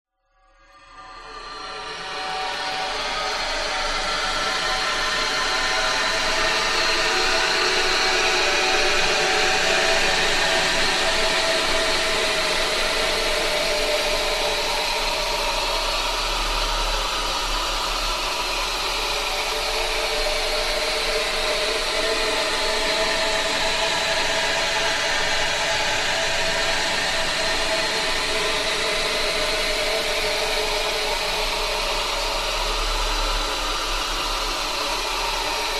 science fiction atmosphere airy high pitched hiss desolate losing hope; zapsplat